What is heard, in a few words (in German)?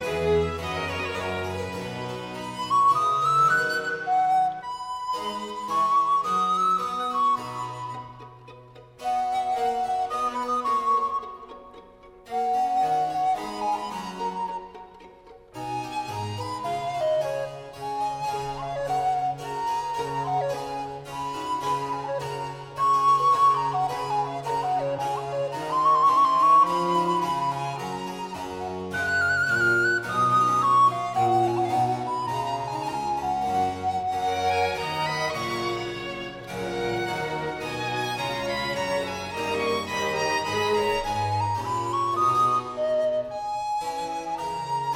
concerto c dur fuer altblockfloete streicher und basso continuo allegretto